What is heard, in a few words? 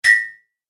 Message Error, beep